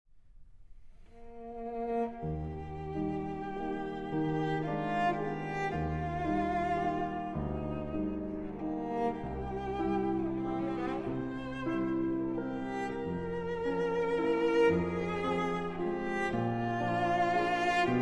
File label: Nocturne in E-Flat Major, For Cello and Piano; Op. 9 No. 2